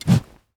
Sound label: salvaged hammer attack; Rust